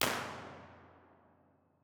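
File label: tunnel entrance f 4 way, impulse response, Innocent Railway tunnel; b-format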